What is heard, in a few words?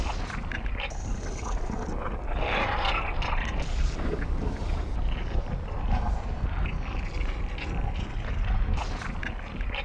lava; GrandFantasia